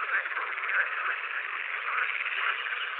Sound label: Pool-Frog, frogs